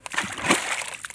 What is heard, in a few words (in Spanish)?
Splash De Agua